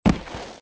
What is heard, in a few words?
man fall; Soldier Of Fortune II Double Helix; XBOX